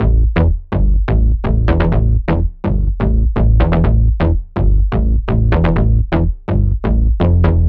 Bass Dm 125Bpm, Techno; Bass Loops; Crossfit; Ghosthack Advent Calendar - Day 6 - Techno Kit

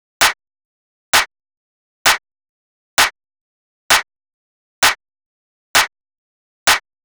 Clap Loop 130, Techno

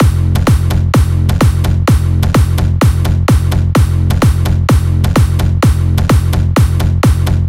Bigroom F 128bpm Full; EDM Drums; Drum Kits; Stems; Ghosthack; Ultimate EDM Drums; BigRoom Kit 02

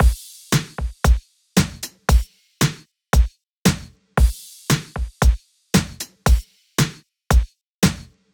Full Drum Loop - 115 BPM, Hip Hop; Drums; Humble Hip Hop Sample Pack

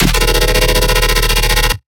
Riddim Bass Hit D#

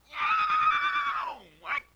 LoFi Synthetic Squawk; Vintage Cartoon; Comedy Vocals

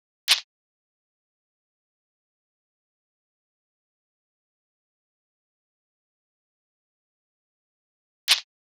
Slow Crunch Drum Loop - 120 BPM Percussion; Cymatics; Mystery Pack Vol 4

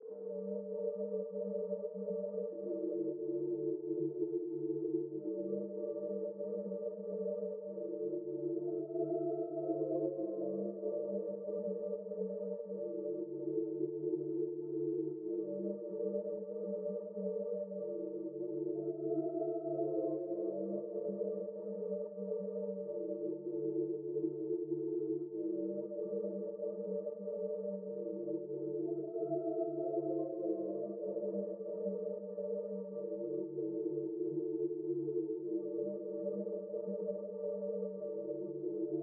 95 BPM G Min Pad; Loop Stems; Cymatics; Modern Classics

